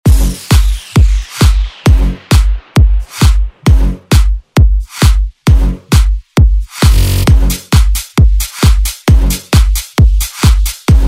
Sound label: Latin Dance